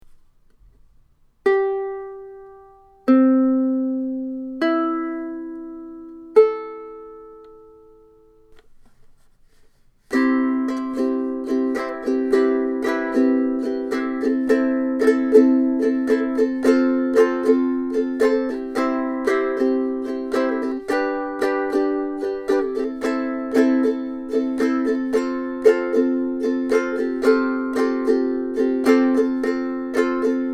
Alaskan yellow cedar black walnut, concert ukulele